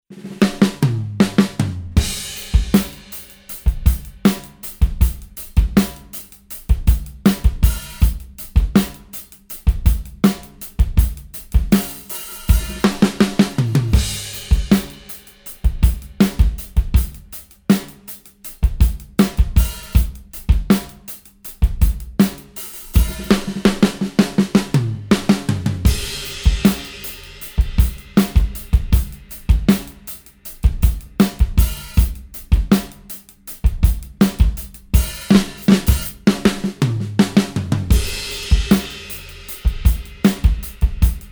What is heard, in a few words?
bebop rock